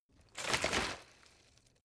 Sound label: bottle water sparse shake single; berufe